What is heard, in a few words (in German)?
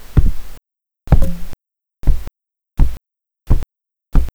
Schlag